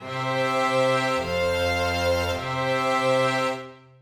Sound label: cadence plagal